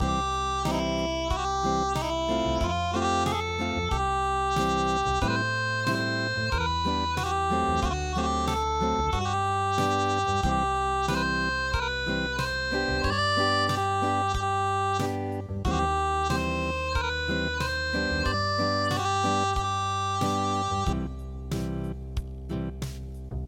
Acoustic, midi traditional

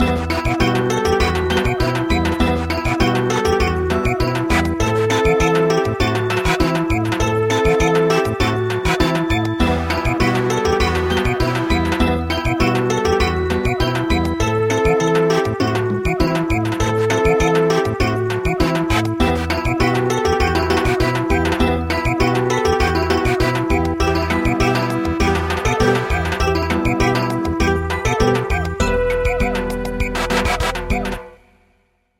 Samba

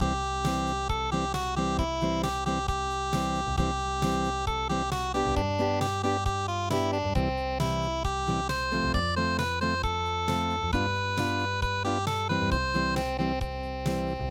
unpluged

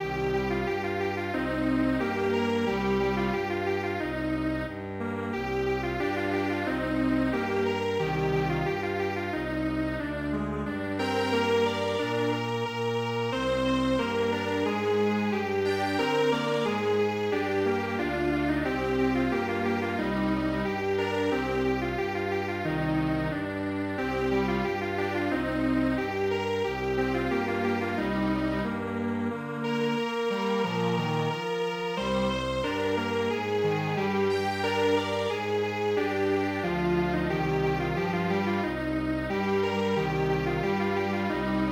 Strings Quartet

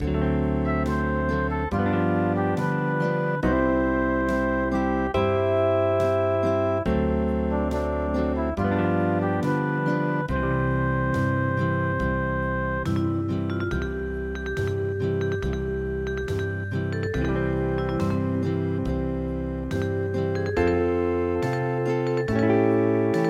Hawaian

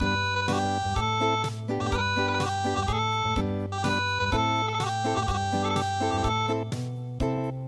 Unplugged; Drawing rabit

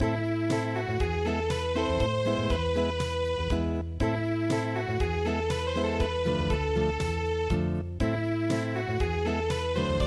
Unplugged; midi; traditional

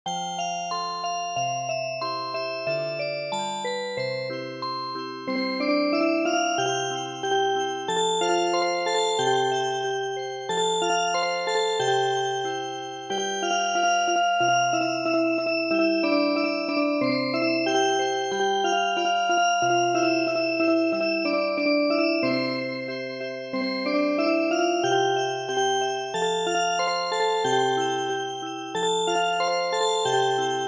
Music Box, traditional

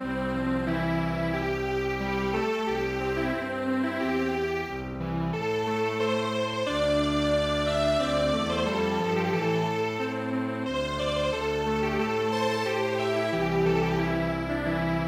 Strings Quartet

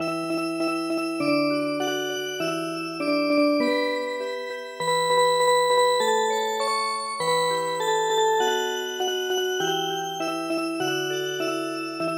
Music Box; The Ground Hog

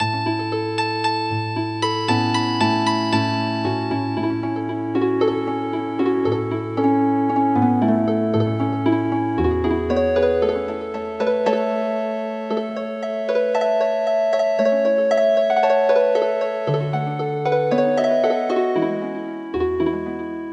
Healin Harp

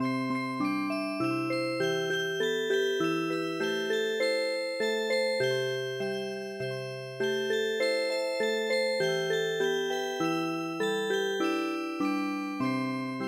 log boat Music Box

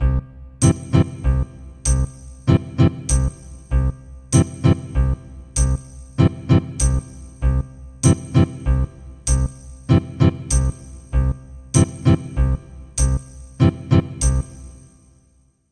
Ethnic Guitar

